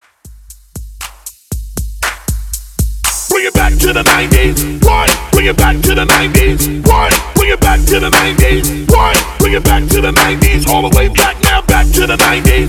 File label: 90s Hype 118 BPM